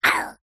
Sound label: cartoon voice high pitched says painful ooww